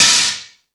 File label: More Cymbals, Drum Machines E-MU Pro-Cussion